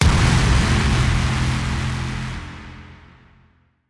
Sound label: Clang Hit Tonal; Ninja Tracks